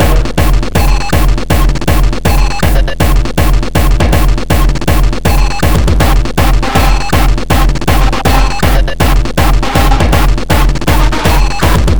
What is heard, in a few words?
Beats Loop; Anger Foot